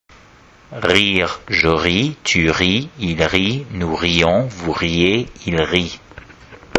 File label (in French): rire; VAUD